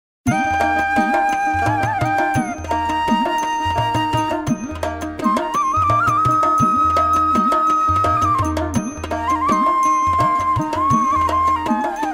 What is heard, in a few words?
Bengali folk song